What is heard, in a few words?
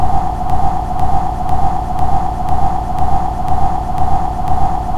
Walking; Oversoul